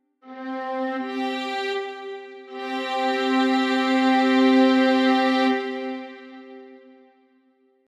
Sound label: chord st power